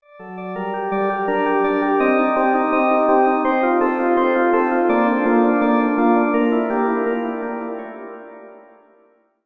Ganymede Organ Beta

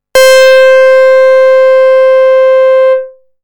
Basic Pulse, synthlead

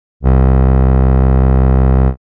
Woodwind A, synthlead

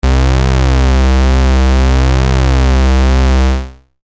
Pwm waver, synthlead

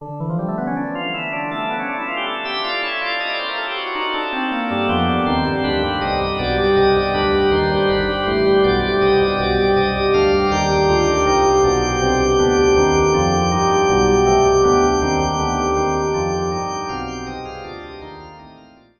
Ganymede Organ Piece

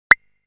Wasp-Drum

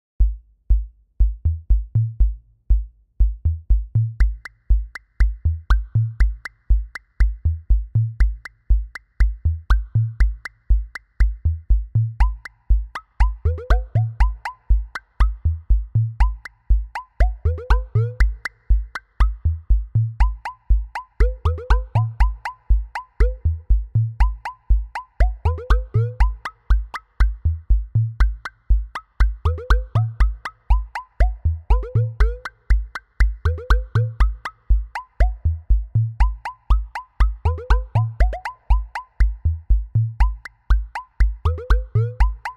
Wasp-Drum-Piece; drums

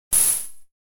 Tambourine

